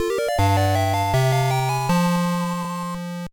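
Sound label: Jingle, Super Game Boy; Kawa no Nushi Tsuri 5 Fushigi no Mori kara; gba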